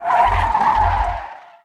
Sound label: skid GPS; Blind Drive